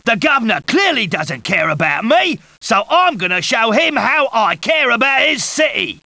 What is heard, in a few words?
rioter; Caesar III